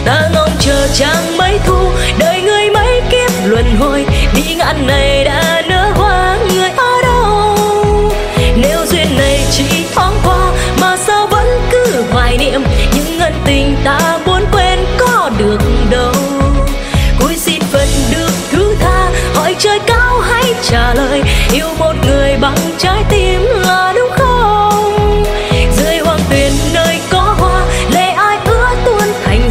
Nhac Chuong Cover

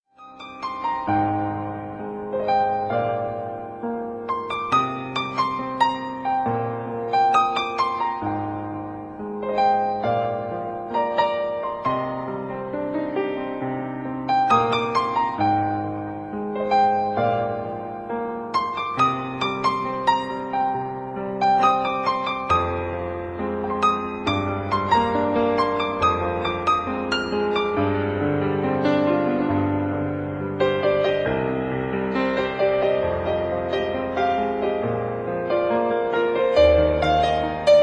nhac chuong khong loi

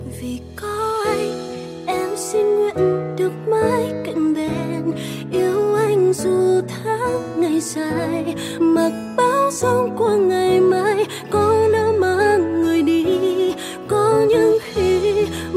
nhạc chuông nhạc Hoa lời Việt

